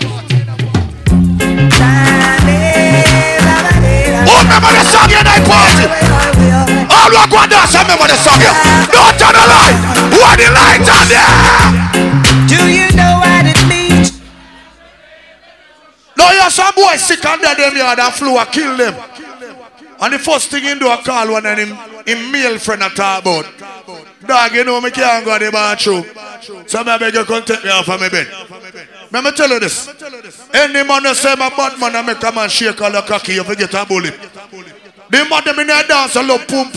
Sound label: Bass